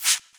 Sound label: Shaker; Equipped Music; Loungin House